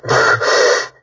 redout short; War Thunder

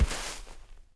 Animal Foot Large Snow; Civ4 warlords

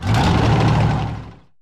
unit move tanks

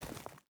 footstep burlap forest walk; Rust

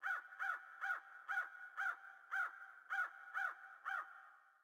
sting crow; Rust

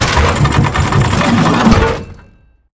damage; World of Tanks

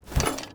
hipcutter foley walk

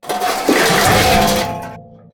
objects, World of Tanks

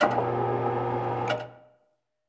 ac130 hydraulics; Call of Duty Modern Warfare

